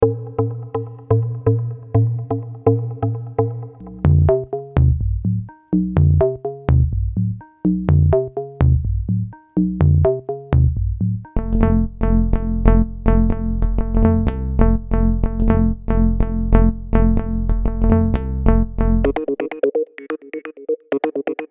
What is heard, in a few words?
Synth Sounds